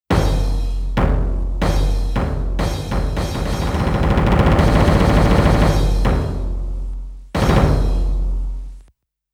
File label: Drum Roll II